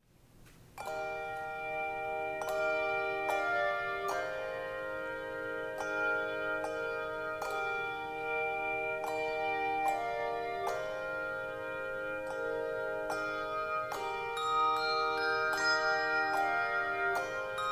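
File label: Handbells; Chorister's Guild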